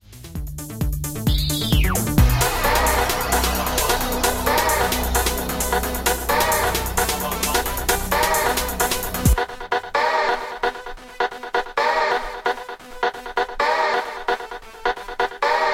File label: dance techno